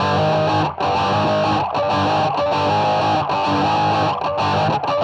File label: Dist Gtr